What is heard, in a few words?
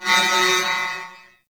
Security Camera Start; Sentient; Sentient Devourer